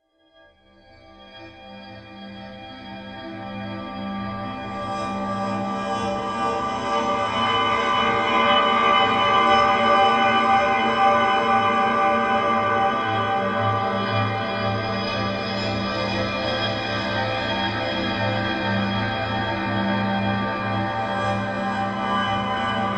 sound design texture soundscape ghost story, Dark Texture/Soundscape; Sound Design - Dark Chilling And Mysterious Texture / Soundscape With Metallic Shrill / Sc; Strange Tones; McKinney Sound